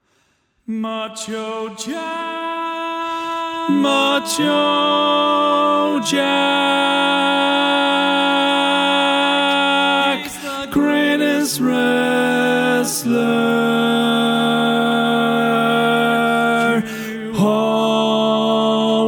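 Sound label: Baritone, Barbershop Tags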